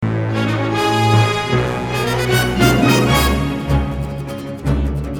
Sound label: Game Tune